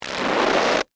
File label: Crunch Corn Starch, Bag; Texture; Crunch Sound Effects; Objects